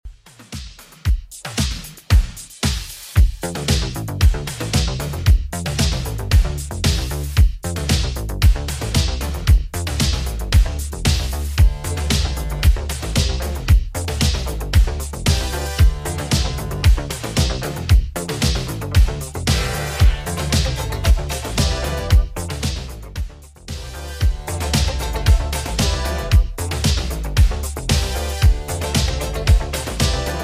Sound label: 80s Redrum 114bpm; remixplanet